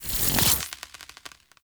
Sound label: Voltaic Weapon Electricity End; Weapons; Enhancements; Elemental